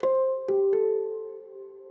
ethno, 125, A

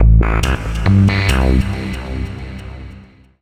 TECHNO EXTRA 140

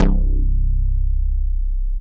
BASS MONO

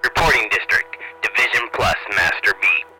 VOC Division Plus Master Beat; Vocals

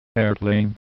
HOUSE EXTRA 125

